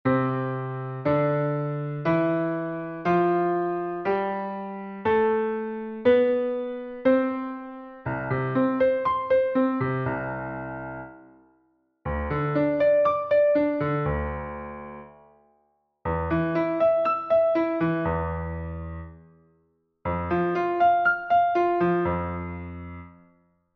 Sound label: Key Of C Major